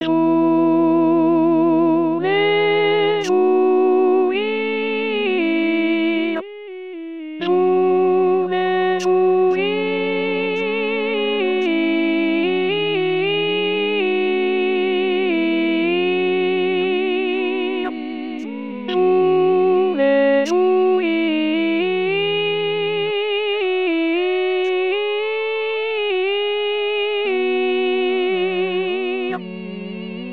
alto

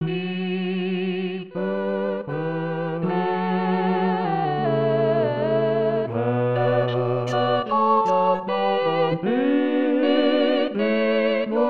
tutti; rimailleurs

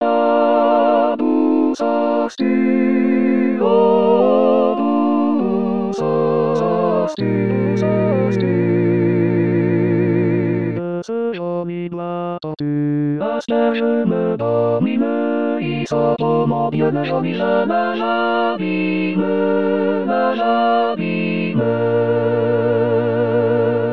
tutti

